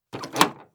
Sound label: Car Door Open Old Model; Fairlight Sound Lib